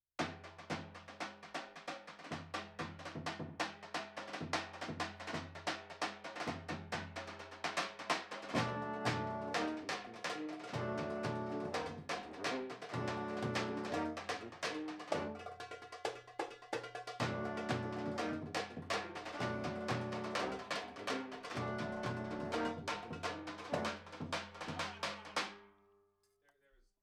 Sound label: first tenor sax